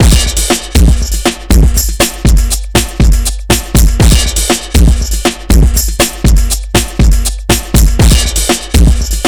HIPHOP, Funky; INIS; Funky Xtreams

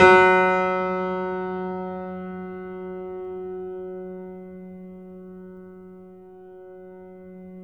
F#, piano sustain; InVision Interactive; The Piano

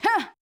Yamaha DJX 2B, "Huhh"

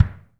BD 1L L, DRUMKIT

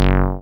TB G2; Best Service Gigapack 1; SYNTB303

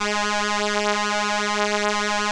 ELKA, String Machines; ELKA RHAP

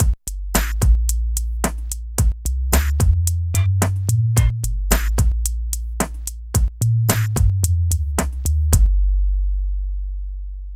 LOOP, Phat & Phunky; East West - Phat & Phunky CD2; 90's Sample CDs

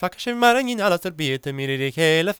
GAELIC, World Voices; ILIO; Vocal Planet

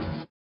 SWUSH; Spectrasonics; Metamorphosis

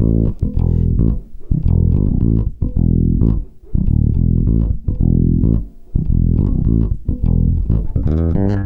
SAMBA C7, Bass Grooves; USB Soundscan vol.30; AKAI

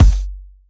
Kik; Rawcutz - Nu Forms

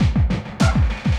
loop, 200 BPM; Ueberschall; Drum & Bass Resonance

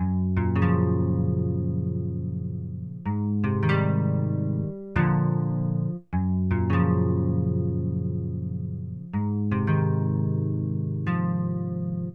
bas Ab, Hip Hop & RnB; Best Service ProSamples vol.34; Black Butta

